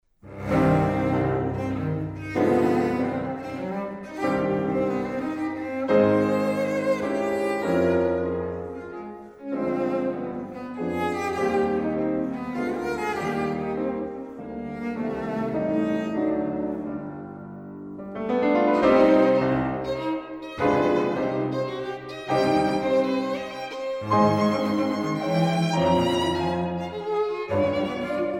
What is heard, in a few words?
piano trio; jadassohn